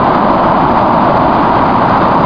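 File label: ambience wind; BZone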